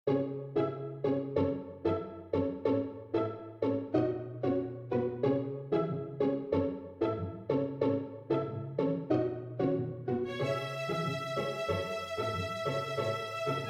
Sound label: arranged for STRING quartet